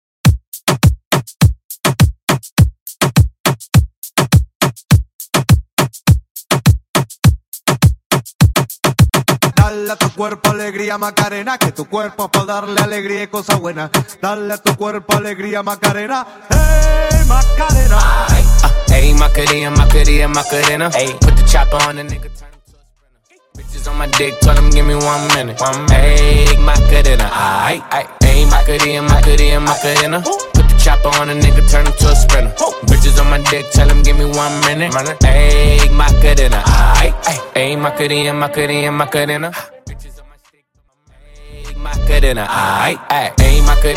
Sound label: Moombahton; Bootleg; Mainstream VIP; Throwback Acapella